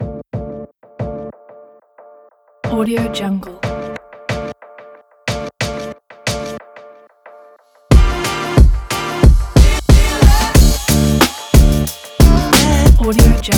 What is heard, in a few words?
background hip hop